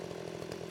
chainsaw idle; Weapons

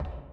plating, Footsteps

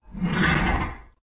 metal scrape

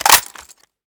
AR15 unload

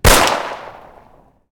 45ACP, Guns Fire

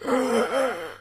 male death gasp

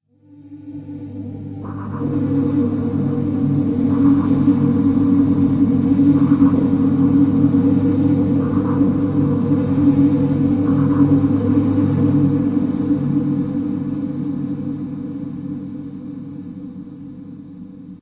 ambience atmos; civ13; civ14

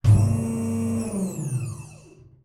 docking

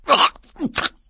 throat, Voices; Civ

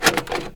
screwdriver close; Machines

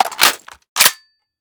smg mag in; Weapons; Guns

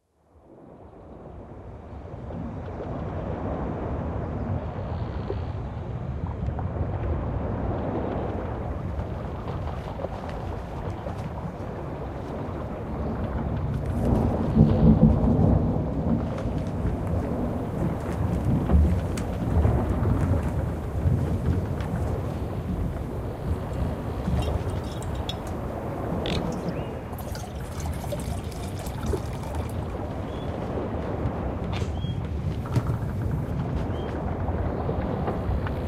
ship, Ambience; civ13; civ14

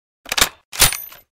barrett bolt open; Weapons; Guns Interact

